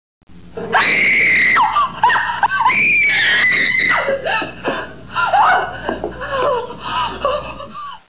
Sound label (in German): Schrei; Schreie